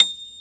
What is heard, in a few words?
Piano Ab5